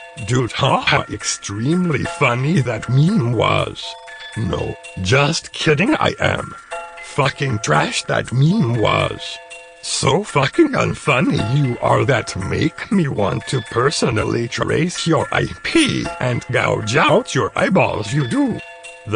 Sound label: dude haha